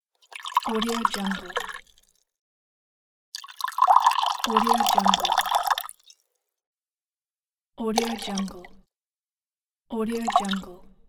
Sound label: pouring